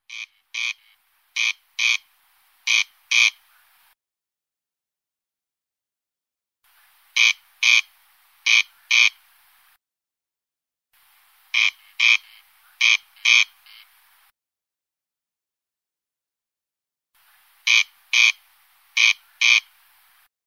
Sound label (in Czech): Chřástal polní